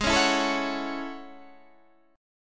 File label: A Major 13th, guitar